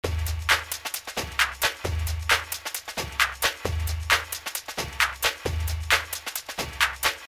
groove highpass

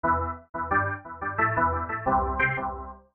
chords

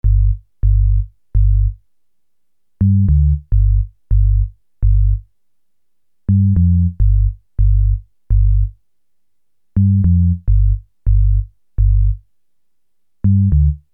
sinus bass, 138

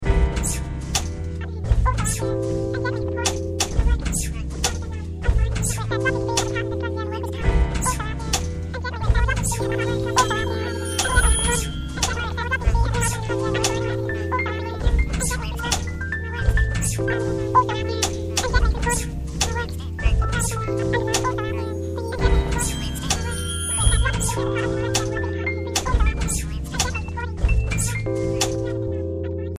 loop light 65bpm

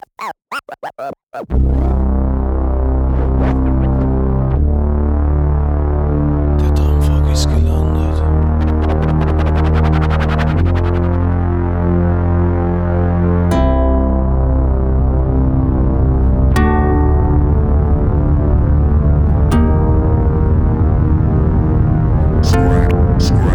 drum frog

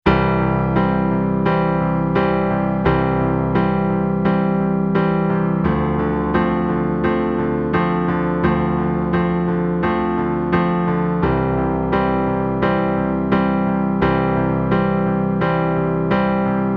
piano

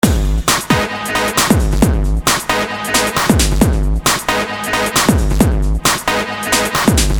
drum break

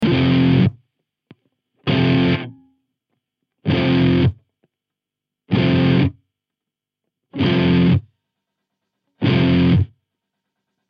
neon git stops

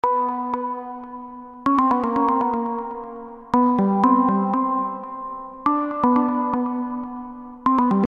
synth

